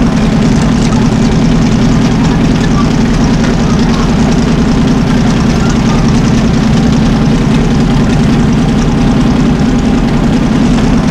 panther engine int rpm load, engines tanks; War Thunder

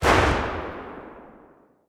mg 8mm maxim4 lastshot; guns; tanks; War Thunder

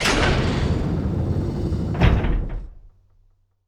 Mi8 Cargo Door Open; DCS

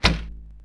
Bow Release; Civ4 warlords

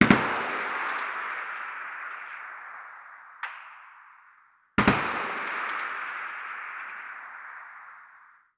automatic, combat atmosphere

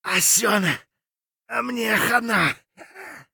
hurt near death; Escape from Tarkov; scav6

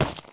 sprint slate; Escape from Tarkov

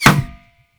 plastic hollow bullet impact; Rust

